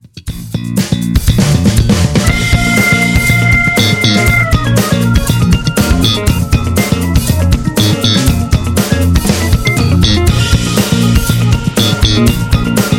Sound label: Funk; MIUI12